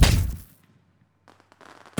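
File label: toxic attack; LoL; teemo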